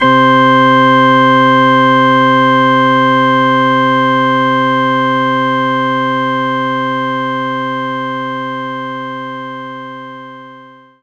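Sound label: Church Organ C3; knights try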